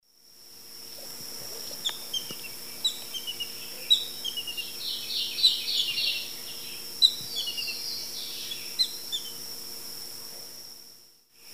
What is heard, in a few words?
Ictinia mississippiensis, calls; S. America; Eastern Lowlands